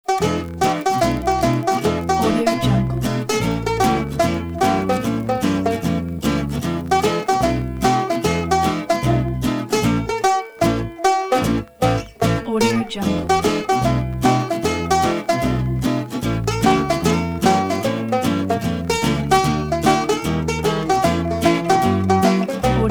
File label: old vinyl ragtime jazz